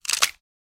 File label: Bone Crab Shell Break